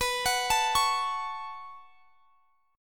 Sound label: B 7th Suspended 2nd; B7sus2; arpeggiated